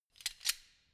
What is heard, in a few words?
wrench slide close soft; schrauben drehen hydraulik